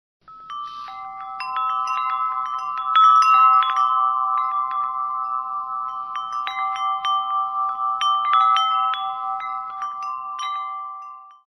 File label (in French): Carillon Grand; BAVIERE